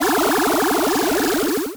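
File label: bubble up rise swipe noisy swipe; navigation button fx; nova sound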